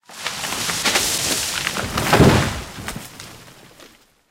tree fall

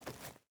footstep burlap forest walk; Rust

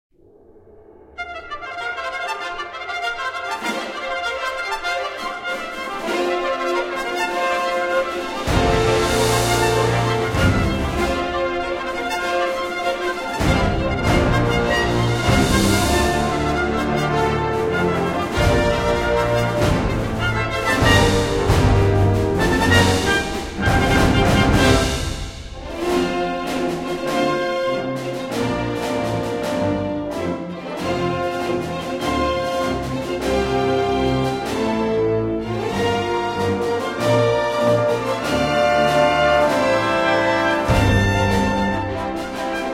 victory, music

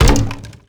impact wood light

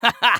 taunt hah

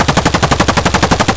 vityaz outdoor close loop; Escape from Tarkov

